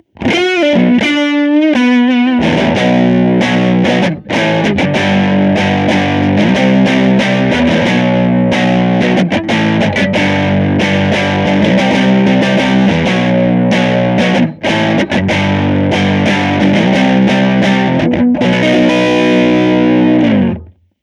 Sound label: blues